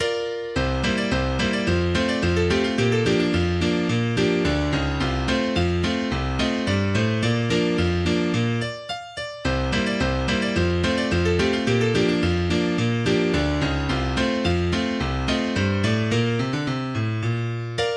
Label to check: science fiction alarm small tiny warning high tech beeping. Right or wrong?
Wrong